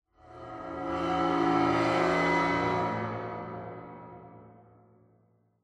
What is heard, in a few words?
cymbal med; Half Life Alyx; cymbals